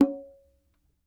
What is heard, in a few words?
Bongo Low Ring, World Percussion One Shots; Sony CS